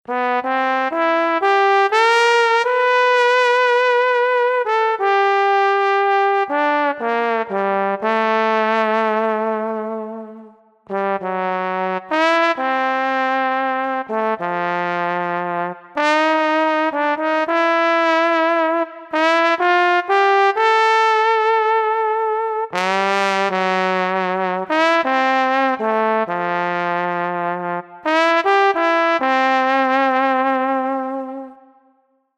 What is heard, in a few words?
solo trombone; ketron audya; bati ses ve ritim tanitimi